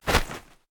Laser; rabbids multiverse; iOS, macOS, Mobile

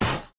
Grate Hit

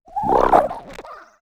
Chaser Catch

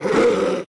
Attack

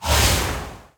Fast shoes Deactivate

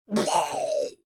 Blob Death; Mage

